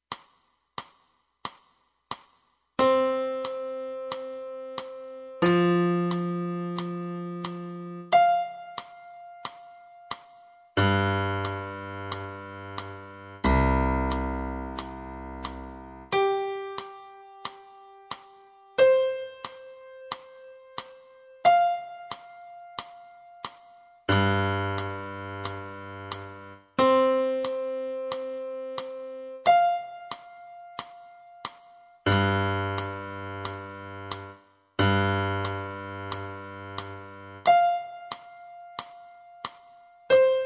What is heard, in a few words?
piano; Notas na Partitura